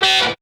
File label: Wind; AMG; Brass n Wind